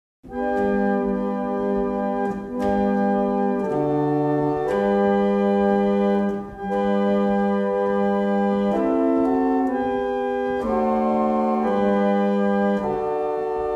Double chant, barrel organs; Wardley; Langdon

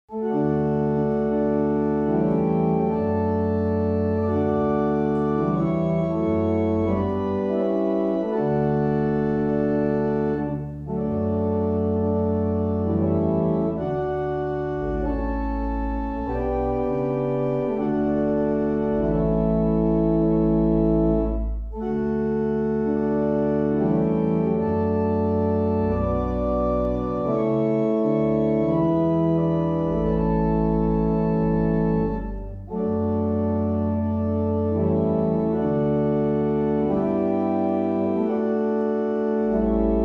barrel organs; Sutton Cambs; Innocents

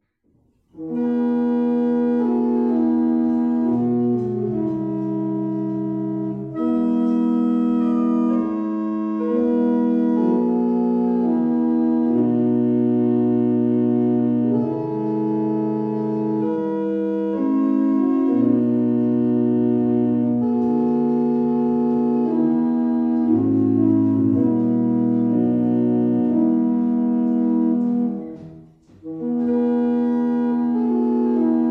Double chant, barrel organs; Raithby

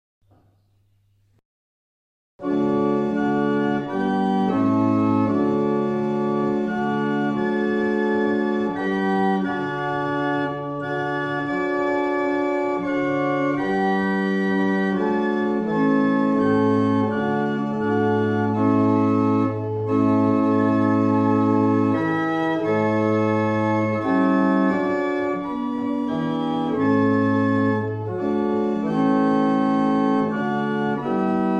Rockingham, barrel organs; Mattishall

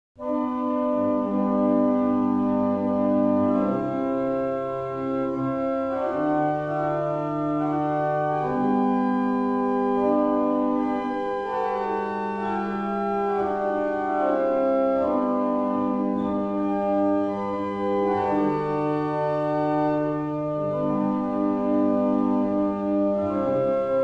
Resignation, barrel organs; Avington

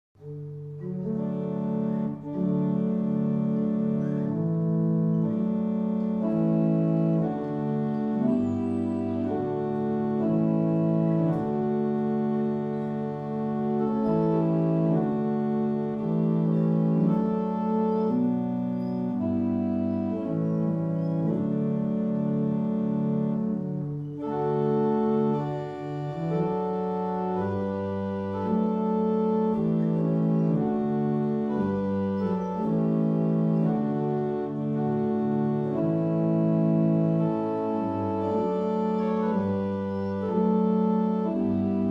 Irish, barrel organs; Fobbing; The organ is operated from the rear. The softwood Gothic case has three flats of gold pain

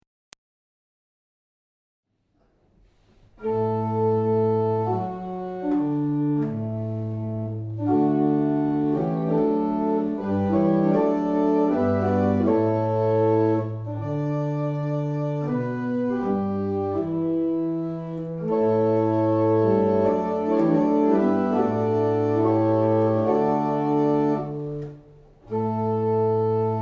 Double chant, barrel organ; Steeple

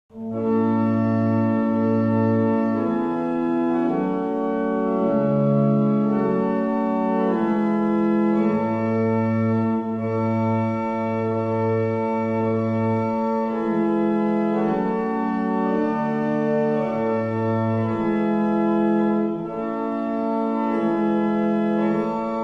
Old 100th, barrel organs; Avington

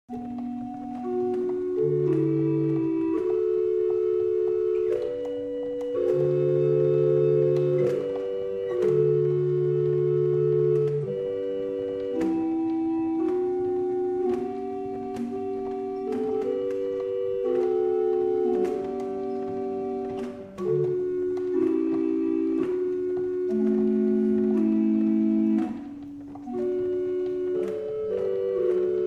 barrel organ; Liddington

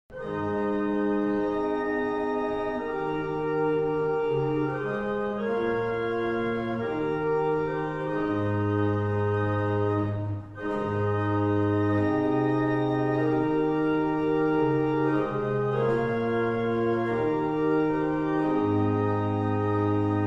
German Hymn, barrel organs; Shelland

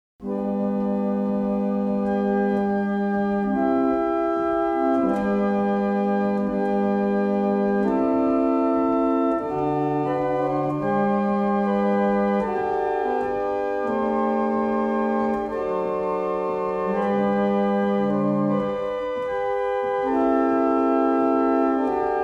Hymn, barrel organs; Wardley; Evening Hymn

